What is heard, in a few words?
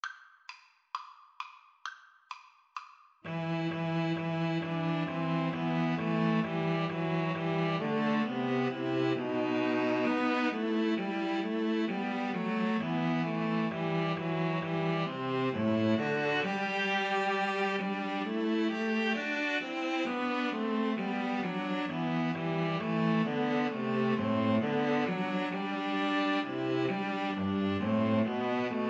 god rest, string trio